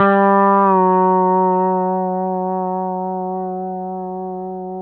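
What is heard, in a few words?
pedal steel bend down G3, Left; PEDSTL BENDS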